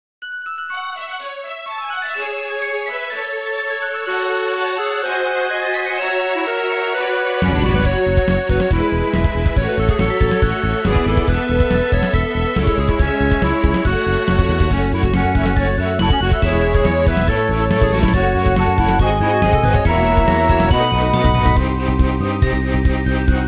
RPG battle

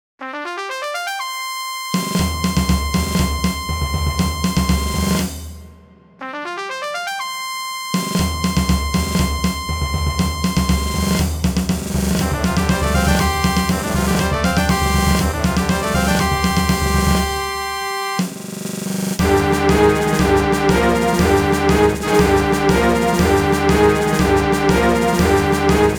RPG castle; Edifice

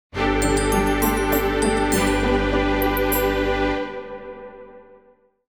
RPG inn

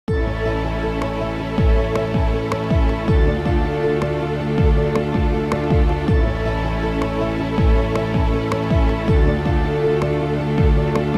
RPG forest; Dungeon

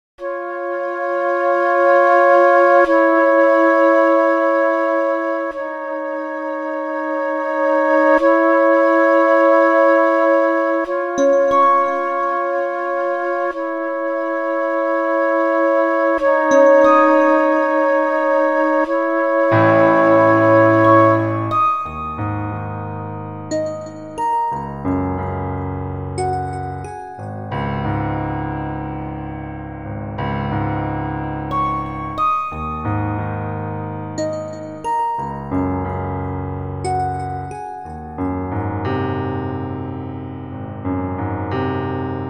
RPG prologue; Event

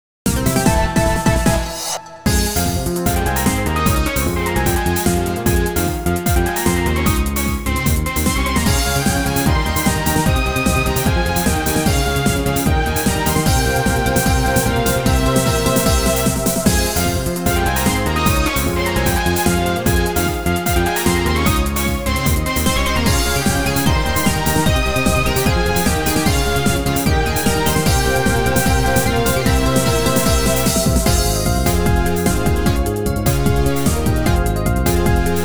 RPG battle

